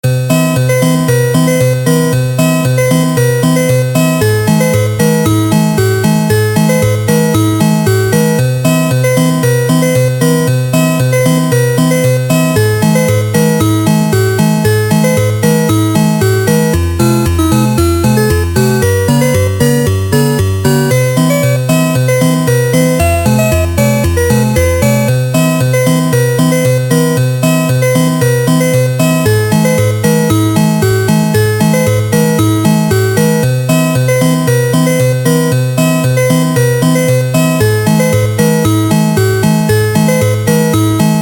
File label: 8 Bit Loop; Workemon Android mobile gamerip 2017